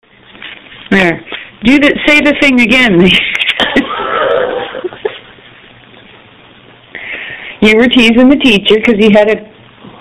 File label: Singing; Knickety Knackety